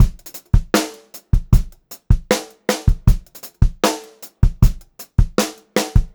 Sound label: Funk-Groove Drumloops, 78 STRVAR; USB Soundscan vol.38; AKAI